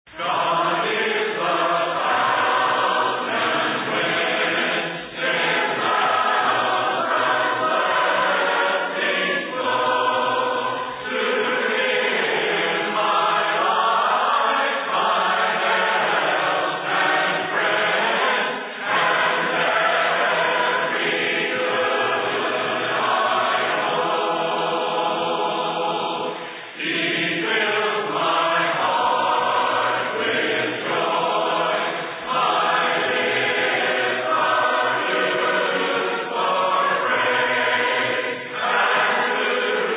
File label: a capella hymn; god is the fountain whence